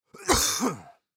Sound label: Human Cough; Cough Short Single Male Fifty Yrs; Human Cough Short Single Male Fifty Year Old; Sick; Blastwave